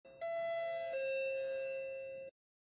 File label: doorbell sounds; bell tone